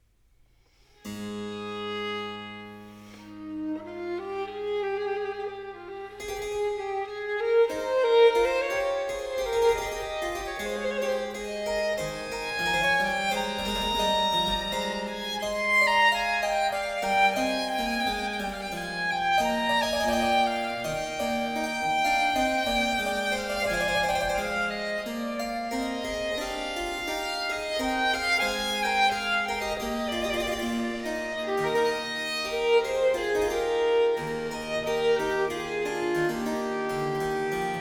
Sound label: Sonata No. 2 in A Major, Largo, Violin and Harpsichord; BWV 1015; Bach; J.S. Bach